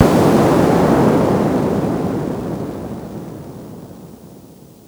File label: ship hit; seawolf